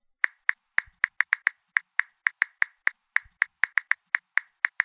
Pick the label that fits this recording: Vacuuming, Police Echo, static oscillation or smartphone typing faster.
smartphone typing faster